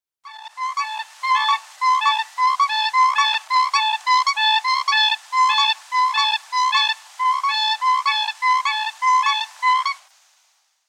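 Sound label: sterh golos, Siberian crane voice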